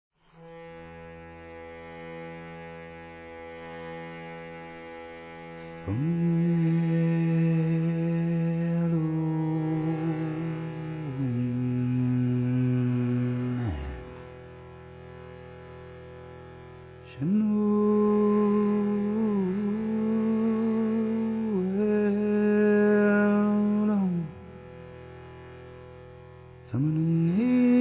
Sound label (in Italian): Canto